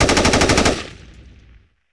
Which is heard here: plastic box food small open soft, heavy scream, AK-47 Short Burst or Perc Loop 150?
AK-47 Short Burst